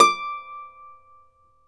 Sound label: Celtic Harp, Plucked Strings; E-MU Producer Series Vol. 7 – Old World Instruments